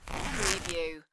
Zipper

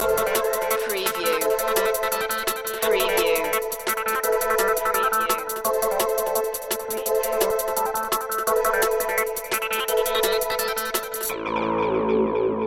loop ending

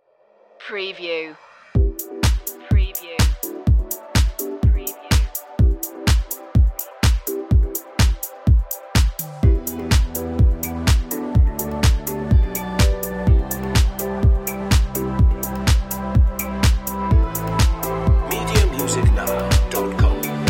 dreamy electro vibe

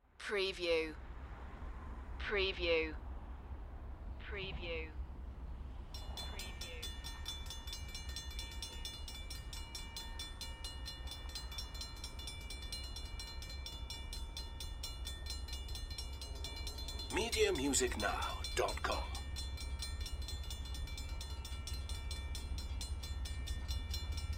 Train Pass By; sound effects bible

